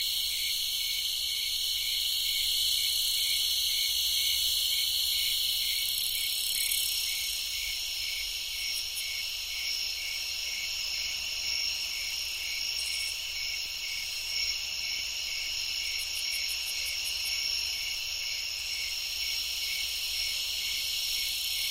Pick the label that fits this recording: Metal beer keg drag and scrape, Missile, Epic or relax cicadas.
relax cicadas